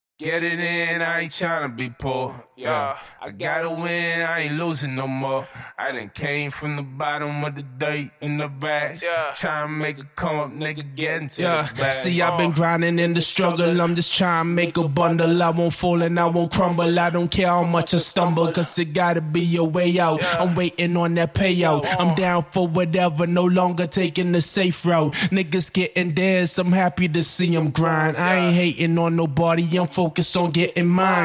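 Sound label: hip hop, 150, C major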